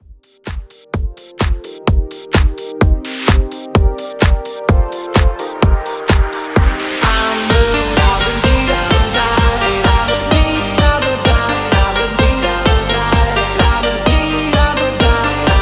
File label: 128bpm Gm